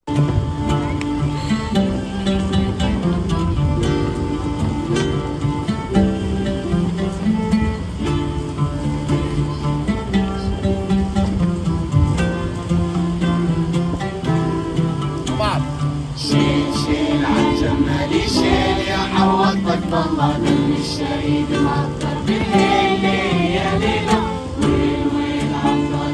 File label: folk group playing in the Gaza ruins